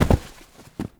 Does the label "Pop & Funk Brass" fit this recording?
No